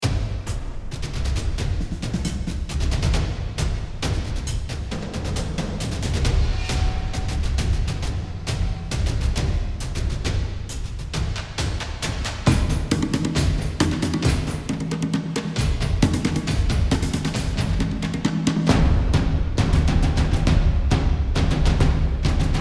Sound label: Battle Intensity